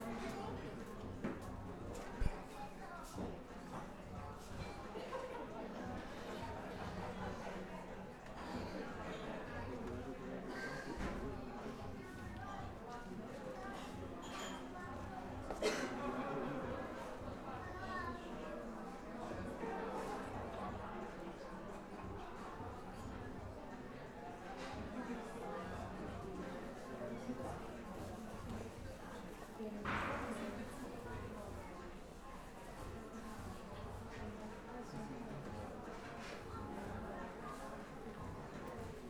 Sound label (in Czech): ruch kavarny popredi i pozadi obcas kroky okolo